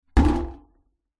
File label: household plastic empty bucket set down into metal sink; zapsplat